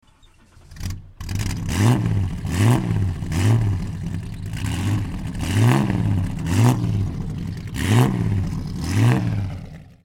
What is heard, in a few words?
Alfa Romeo 155, engine